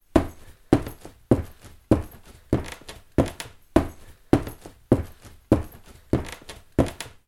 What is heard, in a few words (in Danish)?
BEVÆGELSE Hoppe